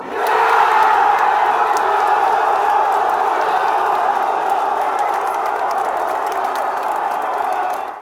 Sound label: goal, fanchants